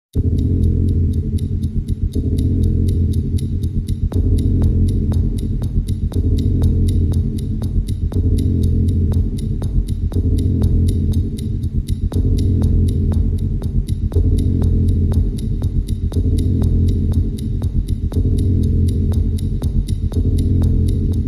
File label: Dark Ticking Drone